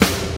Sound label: shiny snare drum sample e key, Royality free snare sound tuned to the E note